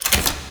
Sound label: Fist Equip Flip; Enemies; Warframe; Tyl Regor; Fists